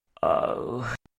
Ugh

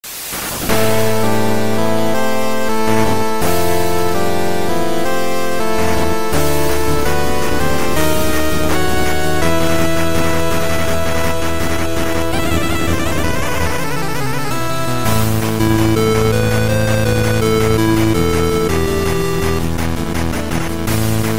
8bit; JoJo

